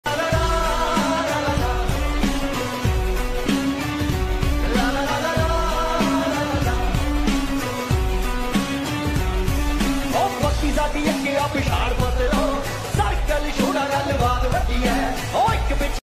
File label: trending song new ringtone